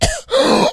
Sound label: gas cough gasp, smoker, voice, idle; Left 4 Dead 2